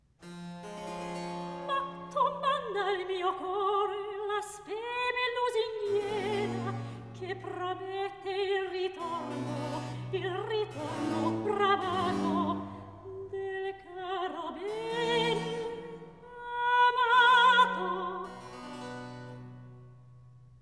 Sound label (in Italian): Recitativo; Crudel tiranno Amor HWV 97; Ma tu mandi al mio core; Handel Masterworks; Klassika